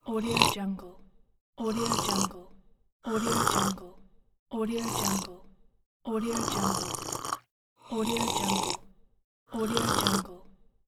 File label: slurping